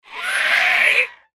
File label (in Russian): golos raptora